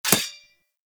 Rising Blades Sword Impact; Warframe; Pax Duviricus

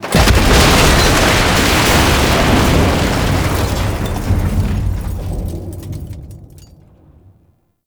Defense Stage Impact; Warframe; Nineteeen Ninety Nine

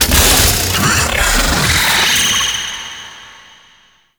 Bursa Moa Reveal; Mission Two; Warframe; The New War

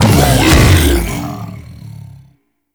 Bowl Start C; Warframe; Grendel

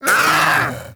Vocal Pain; Hunting Critters; Vulpaphyla

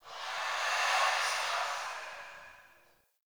Rifle Reload Void Layer; Weapons; Warframe; Zariman

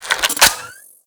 Microwave Pistol Reload; Warframe; Grineer

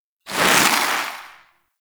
Protea Fan Shrapnel Projectile; Warframe

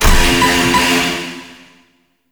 Vaults Vault Alarm On Off; Gameplay; Warframe; Entrati

